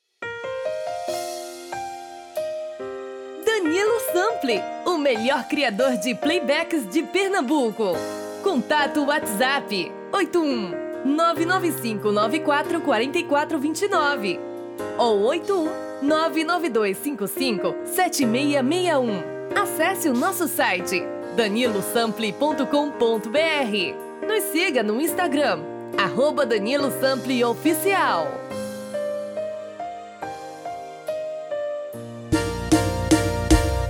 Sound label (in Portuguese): Piseiro